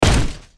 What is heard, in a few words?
foot; blackknight